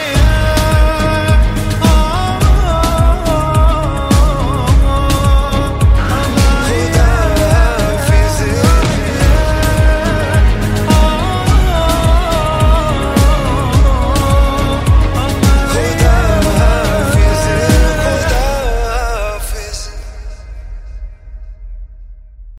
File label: top hindi music ringtone, bollywood